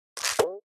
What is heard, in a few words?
liquorice lock broken; Candy Crush Friends